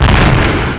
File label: explode; Dynamite